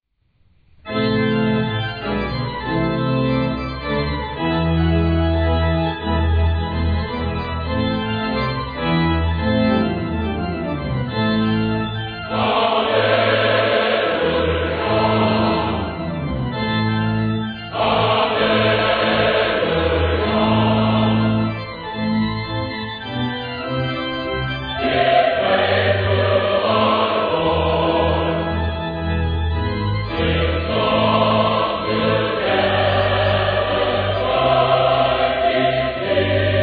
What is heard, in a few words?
Chorale, Organ